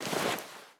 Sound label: Rattle Snake; Rabbids Multiverse